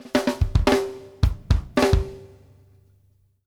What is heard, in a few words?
off snare, drum fills